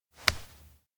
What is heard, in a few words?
slap skin; anemoi